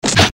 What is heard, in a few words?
eastern fist hit seq